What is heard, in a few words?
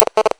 cell noise; Devices of Anomaly Redone; catspaw